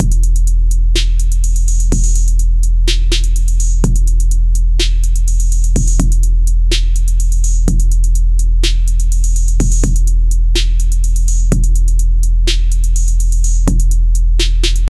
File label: laid back west coast trap, background music